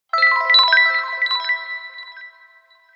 Alert; Lumen; HTC Ozone

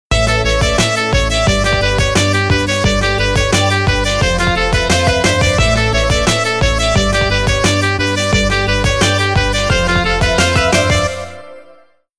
80's groove; Sony Ericsson G700

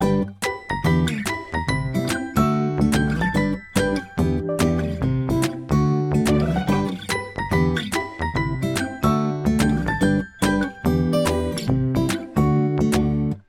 Kindergarten, ringtones; Samsung Galaxy-S26-Ultra; Fun

